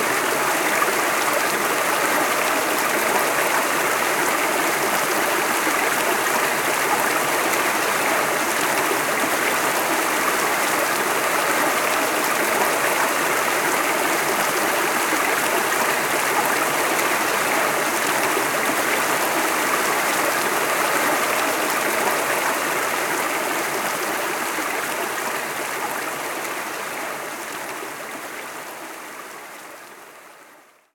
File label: stream small; Level1 Ice Cliffs